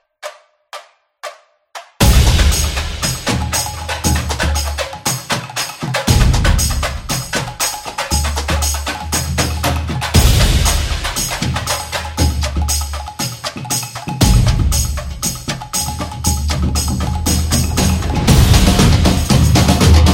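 Samba, Football percussion background music inspired by samba rhythm, stadium energy; action sport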